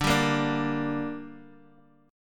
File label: D Suspended 4th Sharp 5th D down Guitar Half Step Down, chord